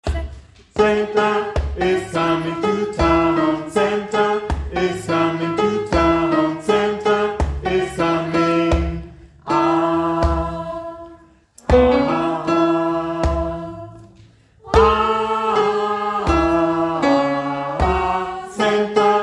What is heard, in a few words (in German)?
Sopran